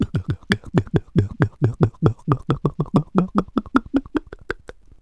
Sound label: big gulp; Wired for Sound Pro CD; Aristosoft, Inc.